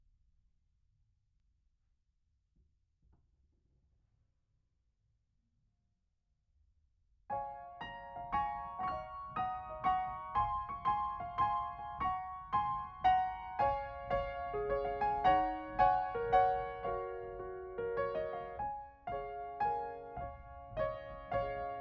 Piano Mono

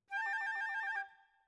Flute

